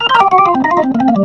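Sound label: Gospel Organ Riff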